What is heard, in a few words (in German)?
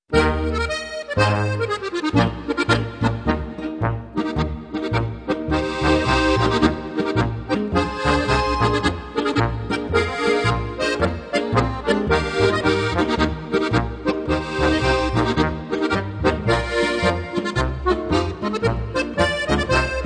zillertaler marsch; So schön klingt Marschmusik